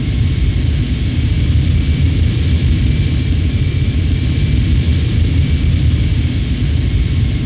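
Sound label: ship startup idle loop; millenium falcon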